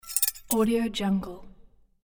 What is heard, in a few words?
dishes